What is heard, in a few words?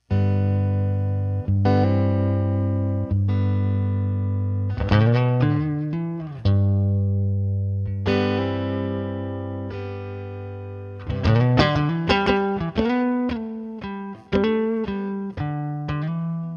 Reverb Pedal R&B bluesy vibes room and longer style ambiance toggled Tele style clean